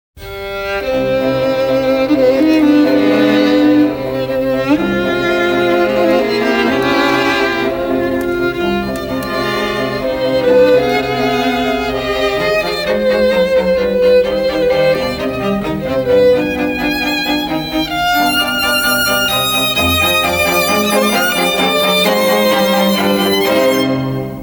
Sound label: beethoven strings loud; emastered